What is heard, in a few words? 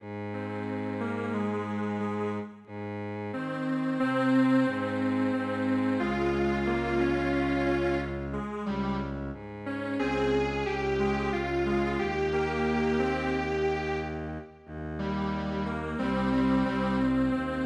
Strings Quartet